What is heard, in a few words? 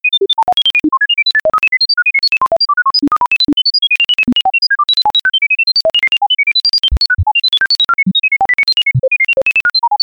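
cosmic sound for sms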